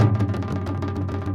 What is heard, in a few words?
INDIA L, Heart of Asia, IND ENS